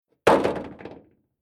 Impact Thin Hollow Metal On Ground Single Hit Rattle Stinger